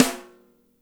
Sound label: SNR; Spectrasonics; Burning Grooves